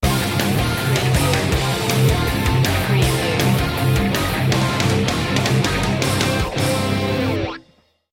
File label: aggressive action sting